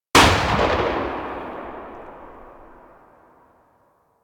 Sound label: Flak Cannon Tail; Grineer